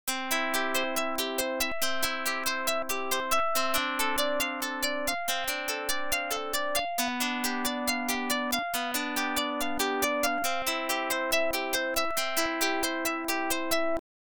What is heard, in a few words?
pluck